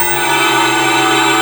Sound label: A#4 METAL; Northstar; The Wizard VOL-1; BELLTASY